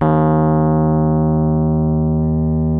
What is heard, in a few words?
RHODES D#1; Sonic Reality - Vintage Timetraveler